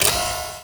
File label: Drop Hatch Start; Doors